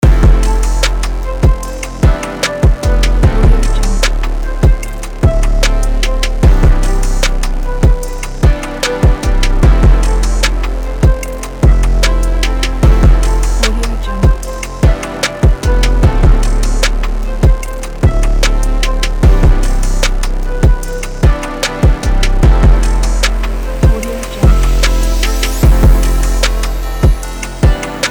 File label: hiphop vlog background